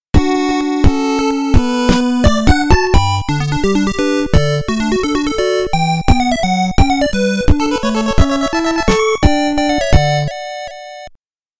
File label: Ending BGM; konami famicom chronicle vol.3 rom cassette edition